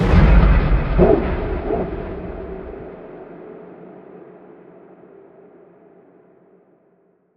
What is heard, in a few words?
Dragon Call, SFX; Ghosthack; SkyRealm